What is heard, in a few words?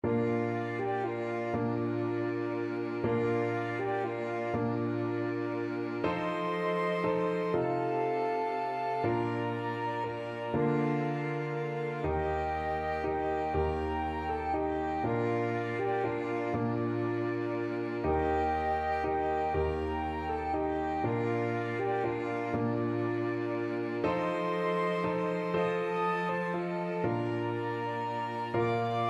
flexible ensemble and piano; 4 players and piano